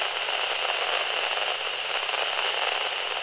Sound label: Radio Noise loop; hidden deep